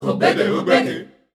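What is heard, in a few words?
Rhythmic Chants, Voices Of Africa, 120 bpm; Ko Bede Obeki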